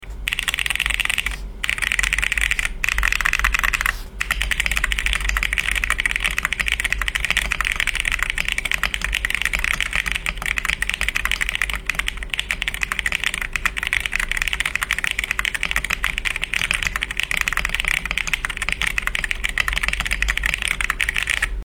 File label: Akko Creamy Blue Pro V3 switch, mechanical keyboard; MonsGeek; What is the Best Mechanical Keyboard Switches